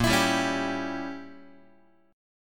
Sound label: Bb Diminished 7th Guitar, Bbdim7 chord; strummed; JGuitar